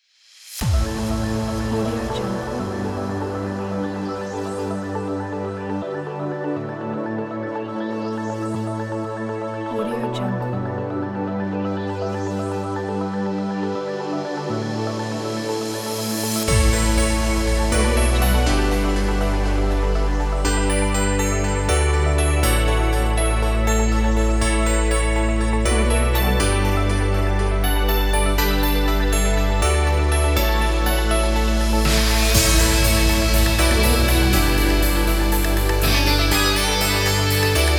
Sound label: 80s uplifting synthpop